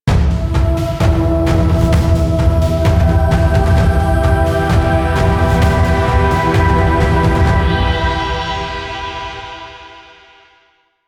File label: Mission Success Cue, Music; Warframe; Railjack